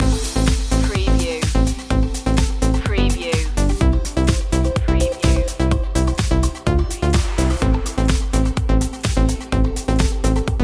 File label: trance loop